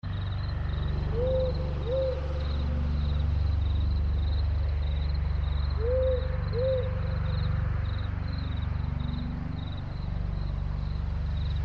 Windy Night 24Hz

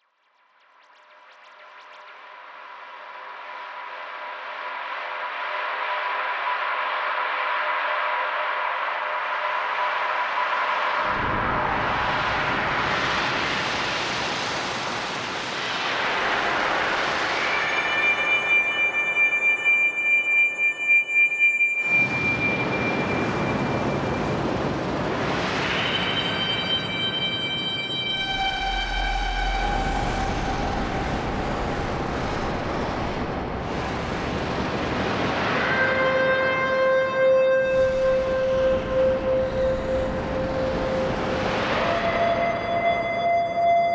Roland SE-O2 Synthesizer Solo; EQD

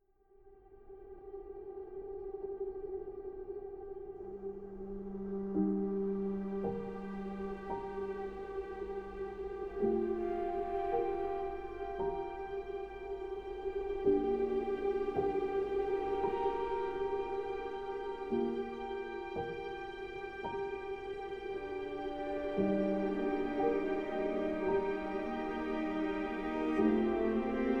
orchestral

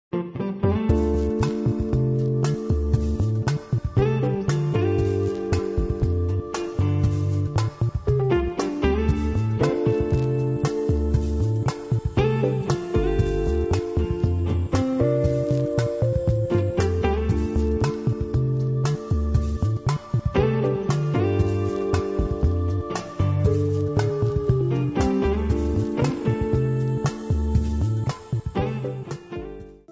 groovy blues

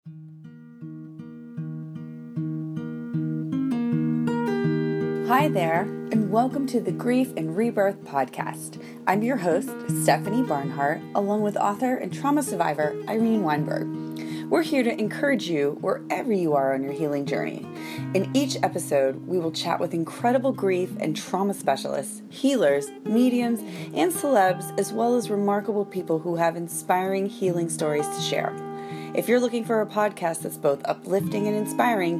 Intro Music

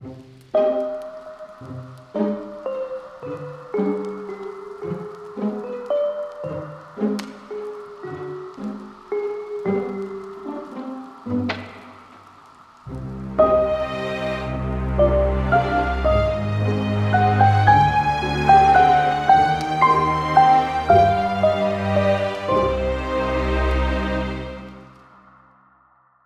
Music

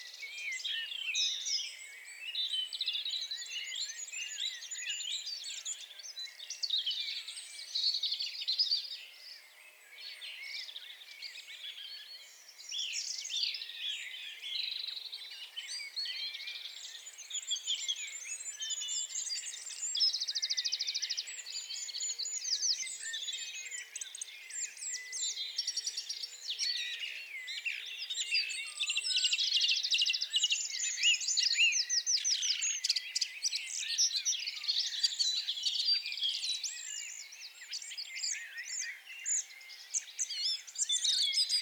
Blue Hour Birdsong Sound; Caro Williams

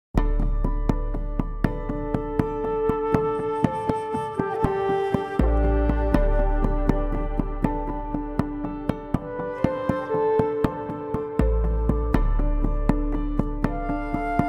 Theme Chapter Break

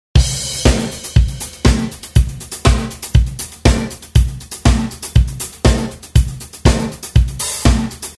120 DRUM LOOP CRAZY WITH CRASH

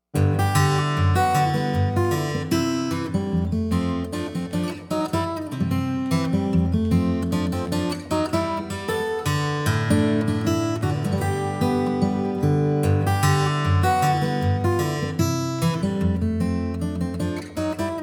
Washington Blues

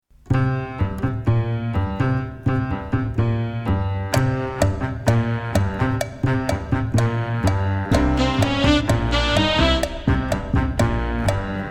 Cha Cha Cha

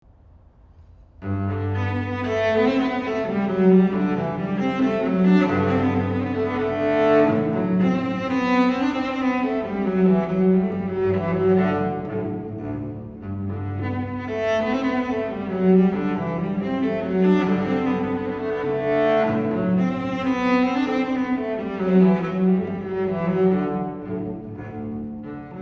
Bach solo cello

